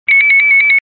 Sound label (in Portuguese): urna eletronica